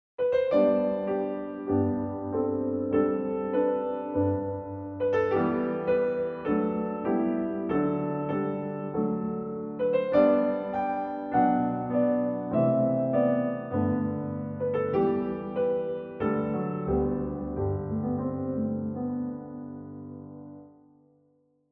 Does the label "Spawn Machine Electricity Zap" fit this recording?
No